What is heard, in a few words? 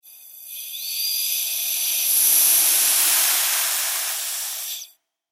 Industry Air Can Expel Slow, hisse; Hisses; Objects